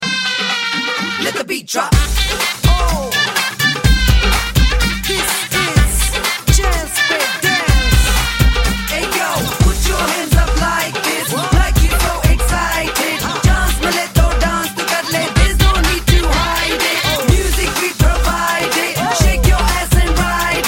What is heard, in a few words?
Dance rock